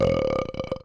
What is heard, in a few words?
A Belch